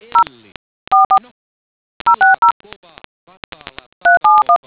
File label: dtmf